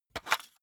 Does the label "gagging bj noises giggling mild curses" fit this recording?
No